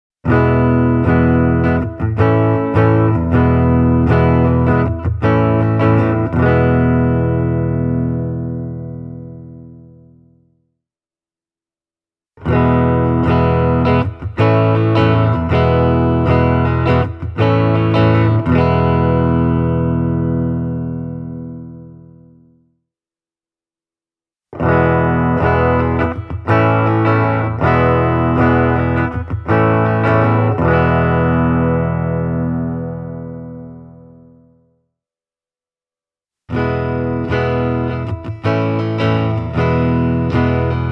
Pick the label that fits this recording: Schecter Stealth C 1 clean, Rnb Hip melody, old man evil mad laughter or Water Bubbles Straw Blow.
Schecter Stealth C 1 clean